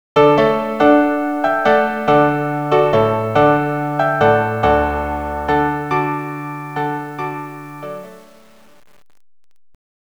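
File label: lentement string quartet; water music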